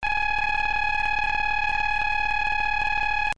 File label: brain gun; Various Strange Sounds and Effects